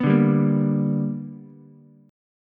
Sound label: B Minor Major 7th Sharp 5th B, Ukulele; strummed; JGuitar